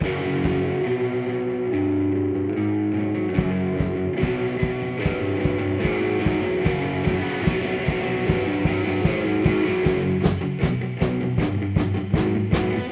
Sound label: Metal Riff